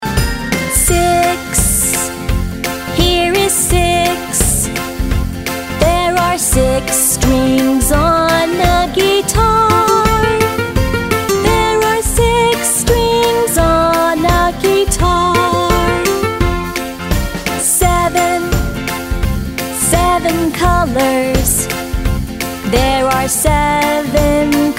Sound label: Numbers Learning Song